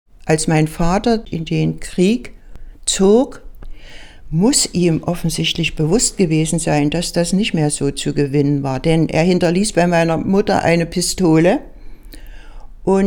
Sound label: Horn